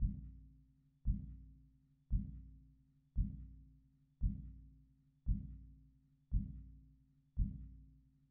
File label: 114 BPM C Min Accent; Dark; Cymatics; Fracture